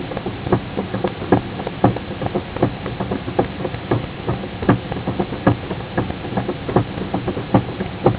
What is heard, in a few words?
percussion